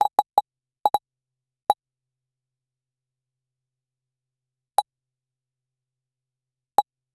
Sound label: soft ui click sound